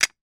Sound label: game letter tile put in plastic rack; scrabble